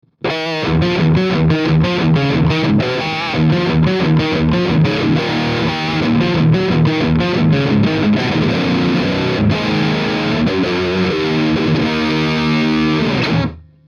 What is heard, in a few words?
marshall jcm 800 full up; Ebmi hard rock riff